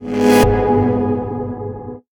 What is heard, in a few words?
Boss Attack Chord